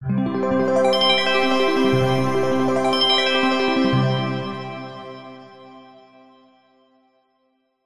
multimedia logo musical synth glissando warm positive trusted; zapsplat